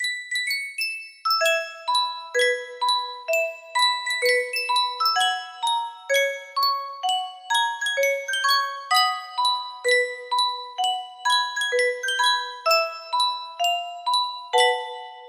yunsheng music box, melody

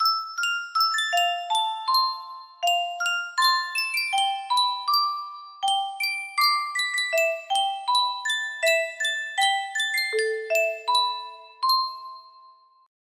sankyo music box

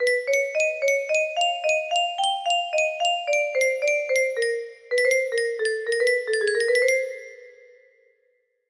melody

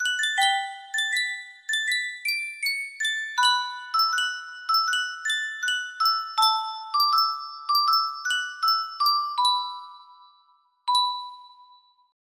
sankyo custom tune music box

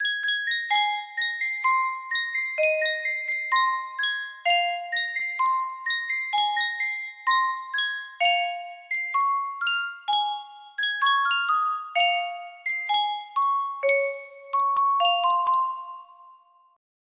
music box melody

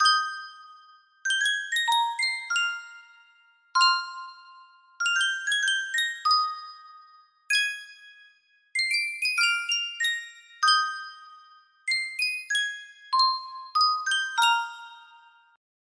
Sankyo 12 note music box

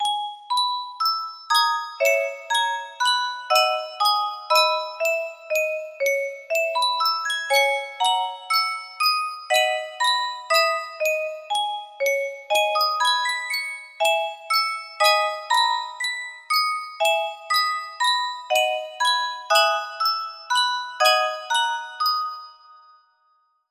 melody; howls moving castle

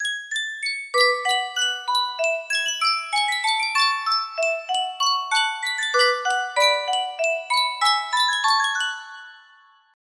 Sankyo Miniature Music Box, music box melody

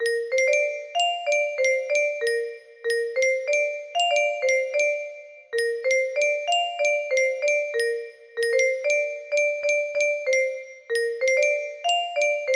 lullaby, music box melody

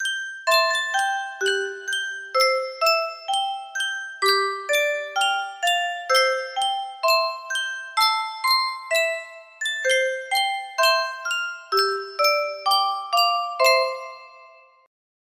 Yunsheng music box, music box melody

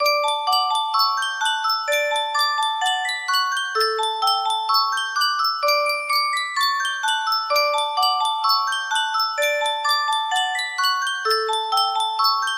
music box, music box melody

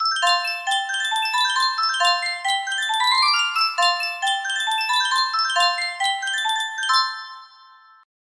Sankyo miniature music box

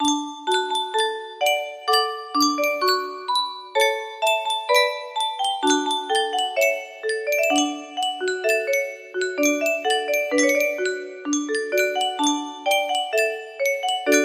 music box, music box melody

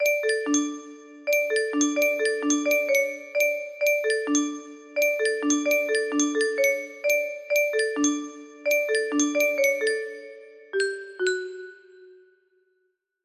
music box, music box melody; msm; gold island

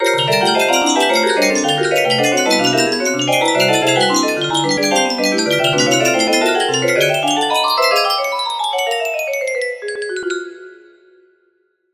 melody

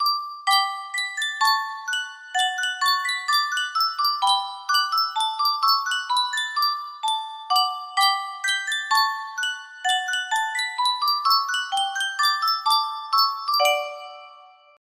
sankyo music box, melody

